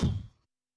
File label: BEATBOX, Yamaha SY85 Drums